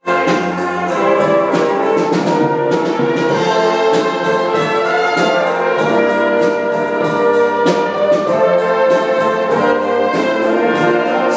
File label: christmas brass band